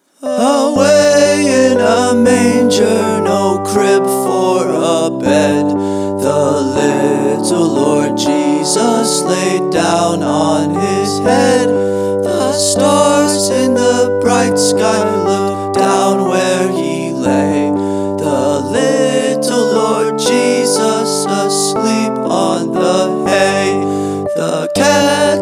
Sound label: Away In A Manger